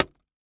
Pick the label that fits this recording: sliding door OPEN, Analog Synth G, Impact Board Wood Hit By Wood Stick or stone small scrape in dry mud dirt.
Impact Board Wood Hit By Wood Stick